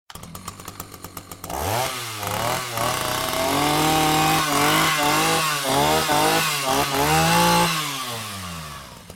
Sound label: Chainsaw